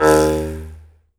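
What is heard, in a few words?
Jaw Harps, Pitched Percussion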